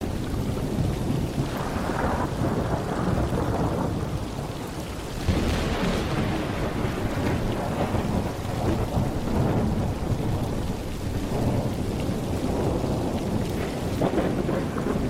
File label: thunderstorm